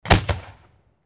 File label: fireworks single shorts